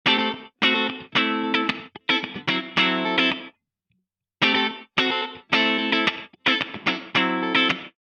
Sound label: Guitar Duck and Gate